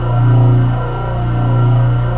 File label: looprods; gearbox; klingon